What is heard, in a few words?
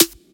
SNARE